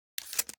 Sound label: marlin loop; superbwarfare